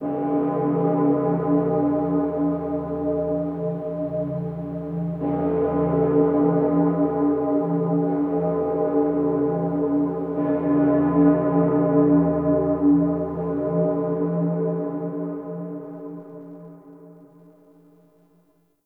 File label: church bell ring clear; Ambience; Dagath; Warframe; Clan Dojo